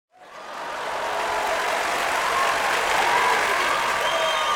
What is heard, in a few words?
Audience Cheering